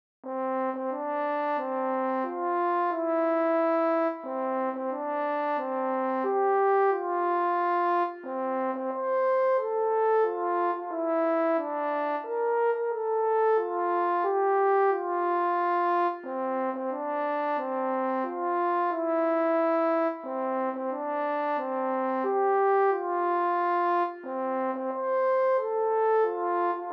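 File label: solo horn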